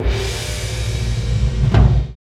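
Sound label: 140 bpm, Asian Daiko Rolls; Spectrasonics; Supreme Beats; World Dance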